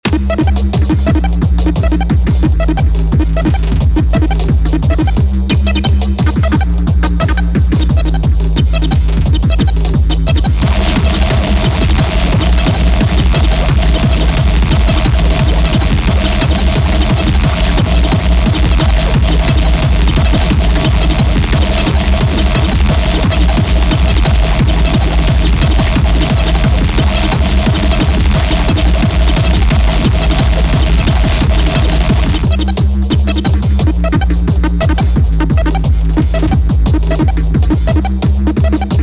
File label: Electribes ES-1 & SX, TD-3; One operating two Electribes ES-1 & SX; a preview from our live sets